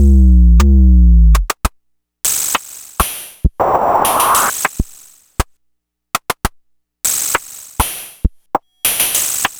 Money Groove, Beats; Sonic Foundry (Sony Creative Software); Ma Ja Le Ethereal Textures

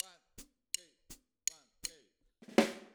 Hi-Hat, 162 BPM; drums

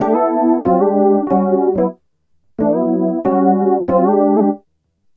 Keyboard

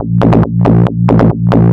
bass 138 A#, bass-licks, Techno; instrument-loops

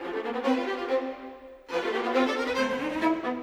Strings; Sonic Foundry (Sony Creative Software); Orchestral VOL-2; Modern 12

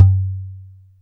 CAJON; Best Service Advanced Media Trax Modern Composer

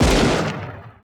GSHOT, LA GUNSHO; Total Control - Action Cutz

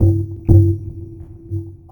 Structured Rhythm, IDM Rhythms; Sonic Foundry (Sony Creative Software); Twine-IDM

